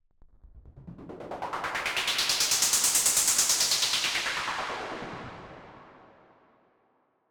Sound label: Swept Noise